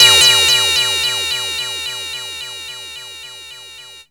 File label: Perc, Nord Modular Drum Samples; Yawgmoth